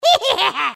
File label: Witch laughs evilly